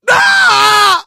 death scream, survivor voice; source engine; left 4 dead 2; manager

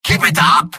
cheers; medic; video games; source engine; tf; mvm

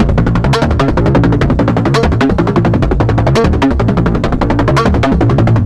horde slayer, music; Left 4 Dead 2; Source Engine; zombat

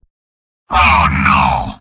negative vocalization; spy; video games; source engine; tf; mvm

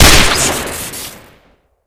shoot crit; letranger; tf

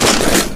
tank end; video games; player; taunt; source engine; tf

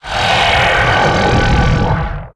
teleport receive; rescue ranger; tf

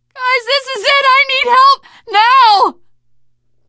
dying, teengirl survivor voice; source engine; left 4 dead 2

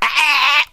odd, infected die; Left 4 Dead 2; Source Engine

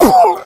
been shot, infected

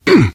hurt minor, biker; voice

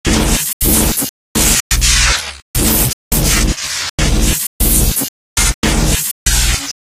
medigun heal disrupt, weapons; tf

